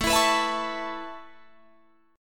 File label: A Major Add 9th A Guitar Drop D, chord